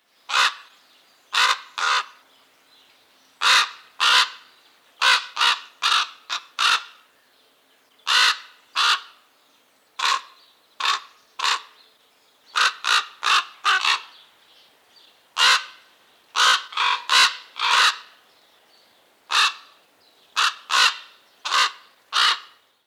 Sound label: Corvus corax call Rufe